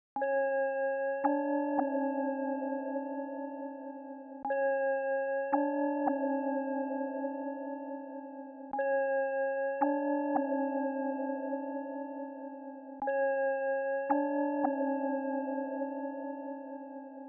112 BPM C# Min Bells, melody loop stem; Trap; Loop Stems; Cymatics; 2022 Melody Collection - Trap Pt 2; Lucky Number

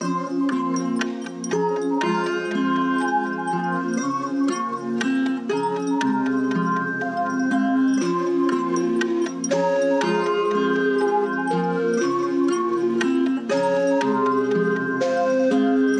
120 BPM F Min, sad hip hop melodies; Void

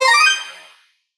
Collectibles Various FX; Video Game; Cymatics; Video Game Sample Pack Vol 1

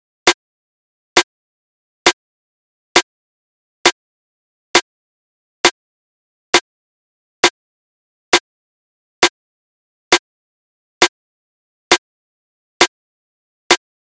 Drum Loop, 134 BPM Snare, Garage; Loop Stems; Cymatics; GHOST (Beta Pack); Drain Drum Loop

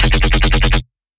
Bass F; Dubstep; Bass One Shots; Ghosthack; Dubstep Sound Arsenal; 50 Bass One Shots

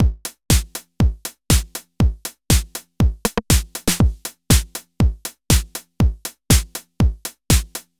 Loop Drums 120, Deep House; Falling; Ghosthack; Advent Calendar 2019

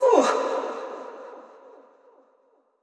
Processed Chant - "Oof"; Cymatics; Euphoria Vocal Sample Pack

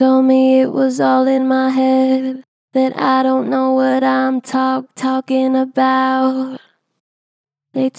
Gen Flat Told me it was all in my head, Vocals; Harmor Vocals

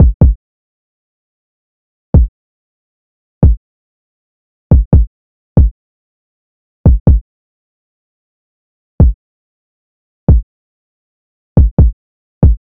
Drum Loop 140 BPM Kick; Drum Loops; Loop Stems; Cymatics; Mystery Pack Vol 4; Pass Go Drum Loop

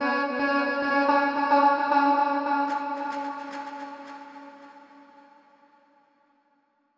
110bpm E, Vocalpack

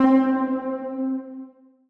PLUCK Week (C); Melodic One Shots; Cymatics; ANALOG EVOLUTION Beta

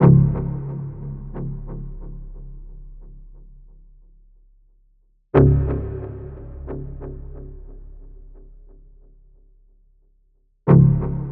Percussion

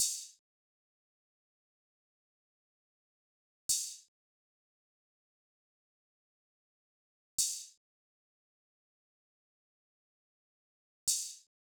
Drum Loop - 130 BPM Open Hat; Loop Stems; Cymatics; DOPE DRUMS VOL. 1; Flavor Drum Loop

